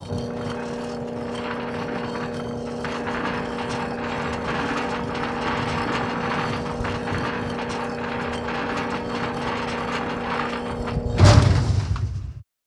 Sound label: Gate move